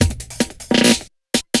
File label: LOOP, Drum & Bass, 150 BPM; Ueberschall; Drum & Bass Resonance